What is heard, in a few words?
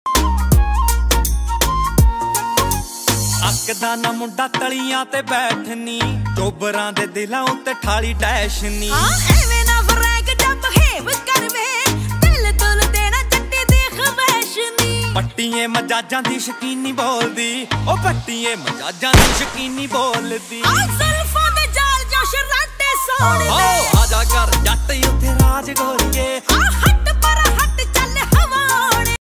Dance Punjabi Ringtone